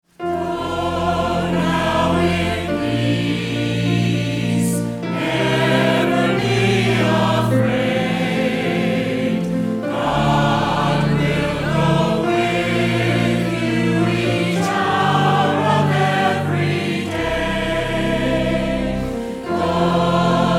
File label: Chancel Choir